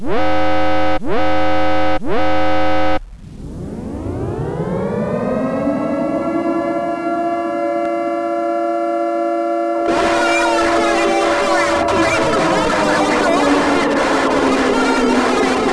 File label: siren